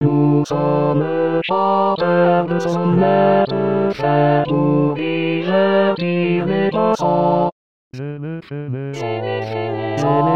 sornettes tenor; 2023 st jean

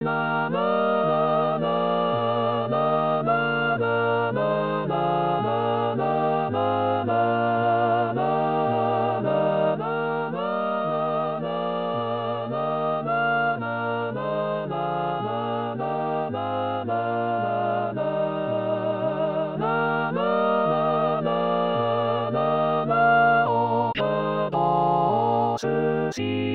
bourgeois gentilhom sopran; 2020 st jean

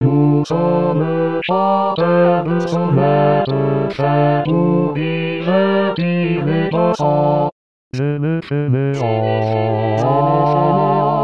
sornettes basse; 2023 st jean

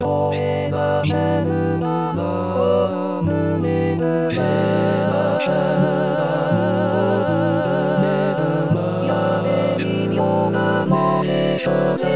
amants tutti; amants2 chr; 2017 st jean

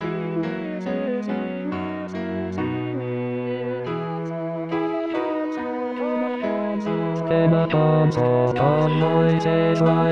basse, baroque; 2022 baroque

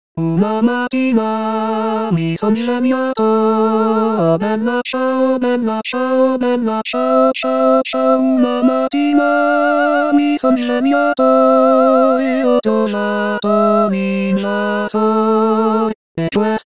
hom; 2023 st jean

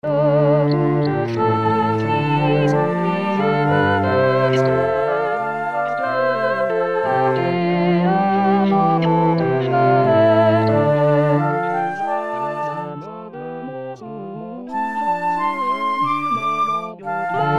soprano

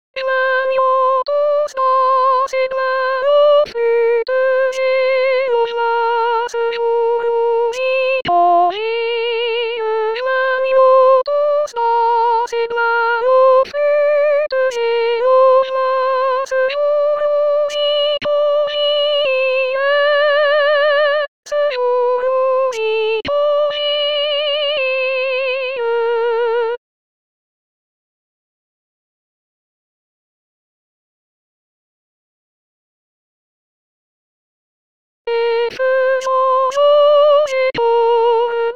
joignons flutes sib soprano; 2022 st jean

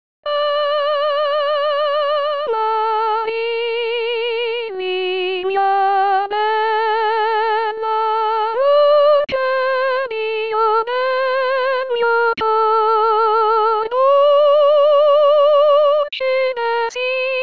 sopran; amarilli; rimailleurs

